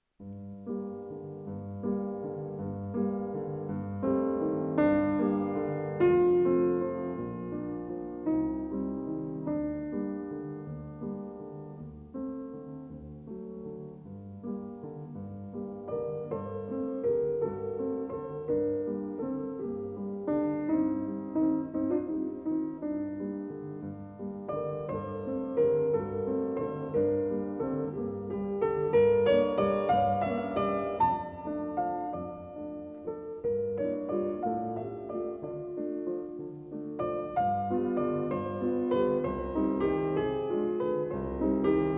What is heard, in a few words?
Songs without Words for piano in G minor; Book 1, Op. 19b: No. 6; Mendelssohn